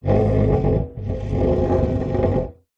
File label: household stool metal framed wood seat drag solid floor